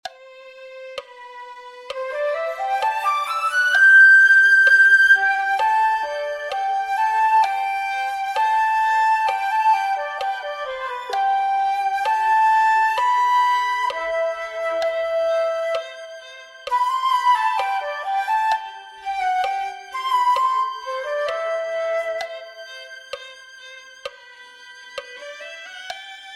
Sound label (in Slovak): Flauta; Chlieb Co Zjednodusene